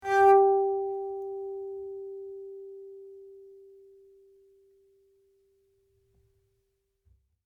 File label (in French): Harmo arco